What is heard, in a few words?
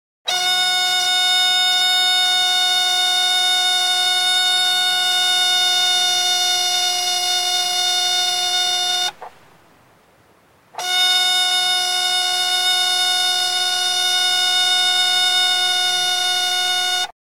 Door Buzzer Economy Car; Cars